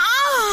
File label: augh